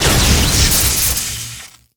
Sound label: Explo Grenade Electric; Destruction